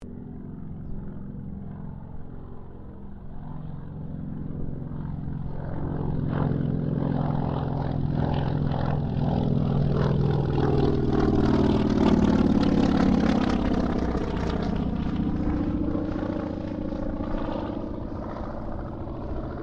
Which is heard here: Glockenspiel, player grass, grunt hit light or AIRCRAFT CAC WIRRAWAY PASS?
AIRCRAFT CAC WIRRAWAY PASS